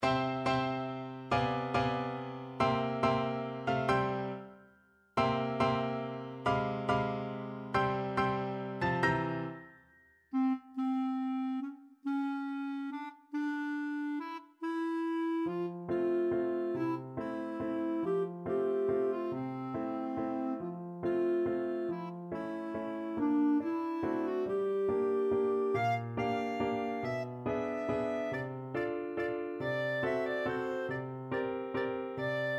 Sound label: black swan waltz clarinet